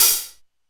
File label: hat half; Drums; Ableton Foundation FreePacks